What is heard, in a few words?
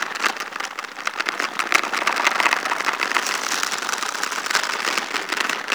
Tendril Grasp Tendon Loop; Warframe; Warframes; Broken Frame